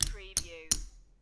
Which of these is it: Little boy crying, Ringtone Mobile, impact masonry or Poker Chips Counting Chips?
Poker Chips Counting Chips